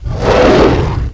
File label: pain; Alien Arena; nemesis